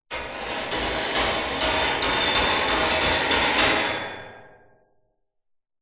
foley metal bars metal object scrape run across rattle